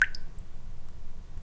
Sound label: drip; gesource